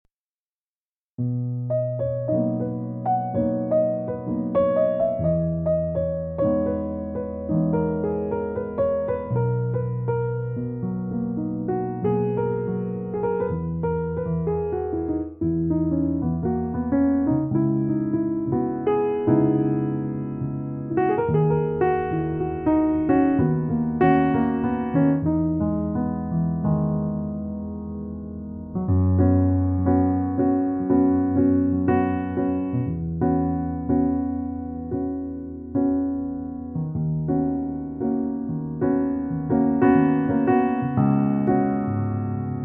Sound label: Jazz Piano